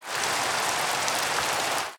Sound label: rain, weather; ambient; Minecraft